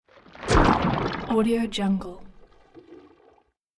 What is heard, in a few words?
whoosh gore spell movement